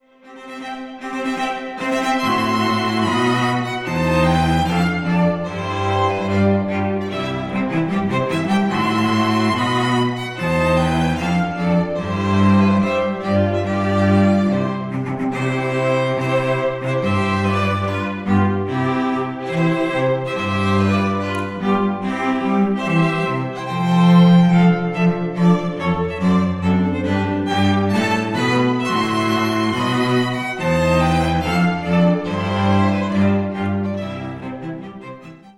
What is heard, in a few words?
String Quartet; Mendelssohn Wedding March